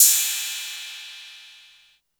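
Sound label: cymbal 808, PERC SET 808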